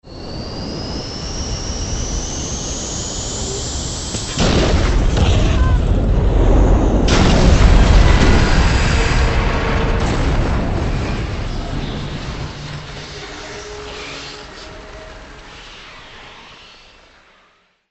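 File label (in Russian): звук падения самолёта на взлёте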